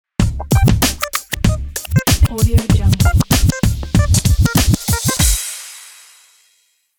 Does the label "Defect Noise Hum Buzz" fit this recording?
No